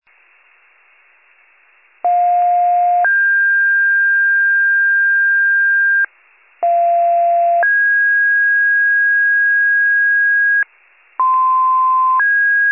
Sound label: fire, pager alert